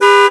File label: Horn, Crowd; David Beckham Soccer; XBOX